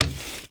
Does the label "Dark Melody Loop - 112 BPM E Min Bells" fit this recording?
No